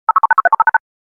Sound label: phone dialling